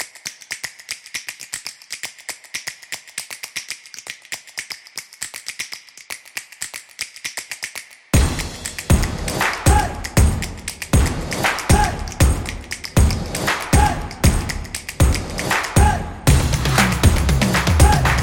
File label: Snaps